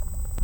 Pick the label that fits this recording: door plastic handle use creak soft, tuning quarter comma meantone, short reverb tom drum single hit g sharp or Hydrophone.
Hydrophone